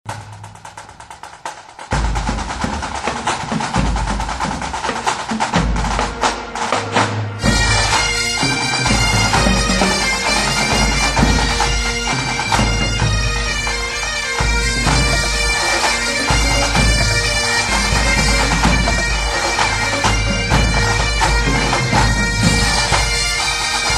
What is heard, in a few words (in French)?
Passepied Treger